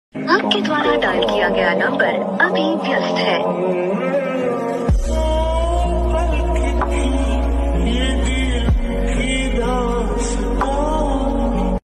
instrumental ringtone

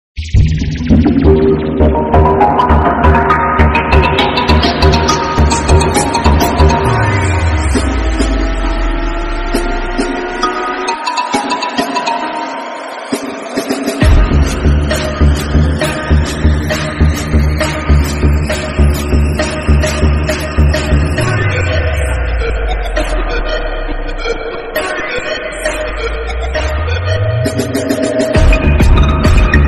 teaser bgm ringtone; martin teaser bgm

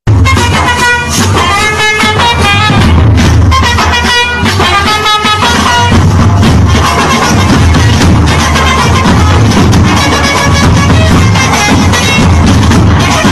bgm ringtone Tamil

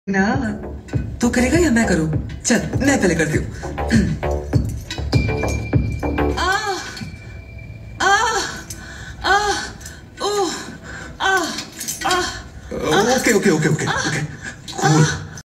girl moaning

